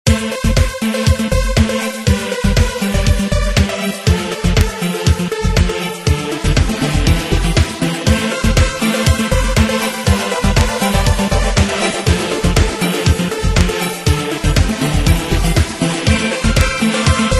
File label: xperia z3 Ring Synth